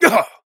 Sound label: pain big; Operative